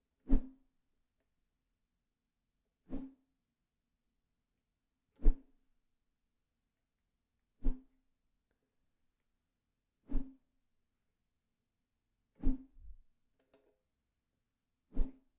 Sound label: Ukulele Whoosh